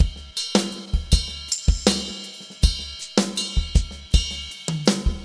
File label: drum; FUNKIN&GROOVIN'